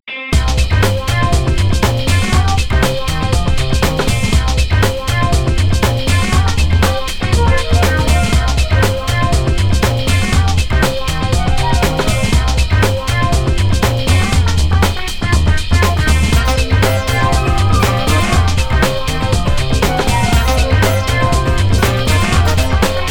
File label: Groove groove lounge